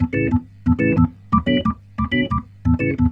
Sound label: Roots Reggae, B-3; Sounds Good - Roots Reggae